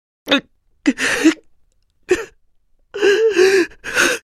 エモーション（悲しむ）「（泣き声）」

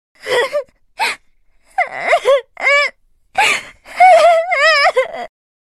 エモーション（悲しむ）「（泣き声）」

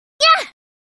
「やっ！」; スキル（ダブルサマーソルトキック）

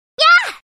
「やっ！」; スキル（ローリング右）

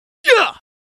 「やぁ！」; スキル（クイックスライド・右）

